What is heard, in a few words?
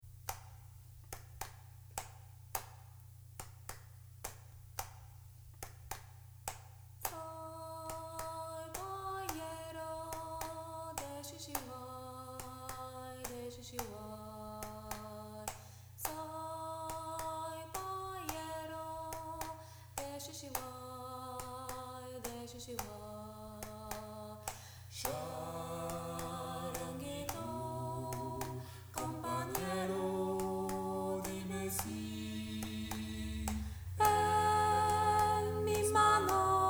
Boyero tutti